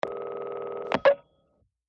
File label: Tel Inline Ring, futz pulse rotary rings